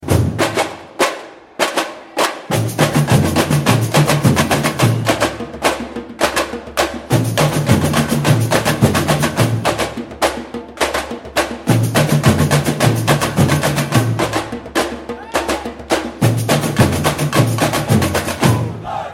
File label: Samba break, rhythms, bateria; M-A break (original)